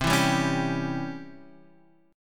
C sharp Minor Major 7th Flat 5th C, guitar chord

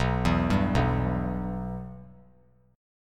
B Suspended 4th Sharp 5th B

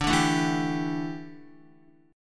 D Suspended 2nd Suspended 4th D down Guitar

